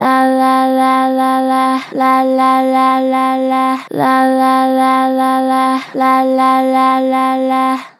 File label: Lala, Vocals, Pitch Flat Gentle; Harmor Vocals